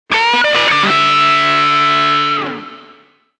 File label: solo guit; MOBICLIC 64